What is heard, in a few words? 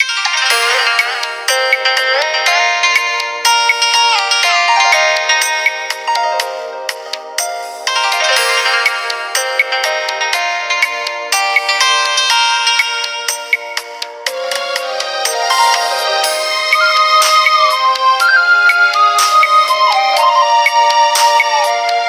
ringer; ZTE Open C; ringtones